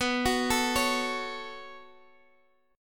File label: B 7th Suspended 2nd B down Guitar Standard; B7sus2 arpeggiated; chord shape; JGuitar